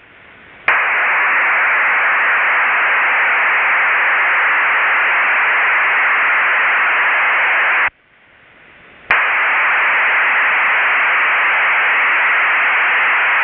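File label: 3G BW3 LDL 512 PDU, MIL-STD 188-141B appendix C; MIL-STD 188-141B App. C BW3 LDL Data PDU (512 bytes payload per burst); PSK-systems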